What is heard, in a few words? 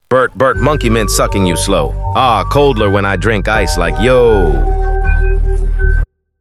brrt brrt brrt brrt; baptabim